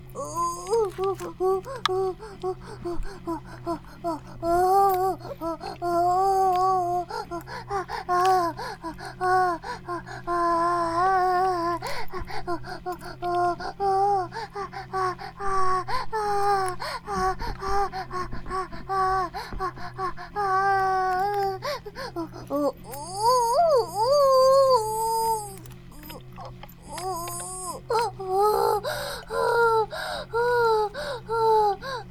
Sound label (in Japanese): バイブ放置, ASMR